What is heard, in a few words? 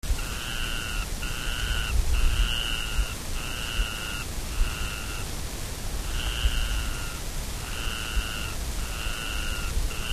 Micropygia schomburgkii